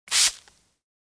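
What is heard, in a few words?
spray aerosol single spray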